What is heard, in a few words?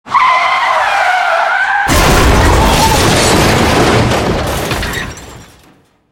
car skid crash; Car SFX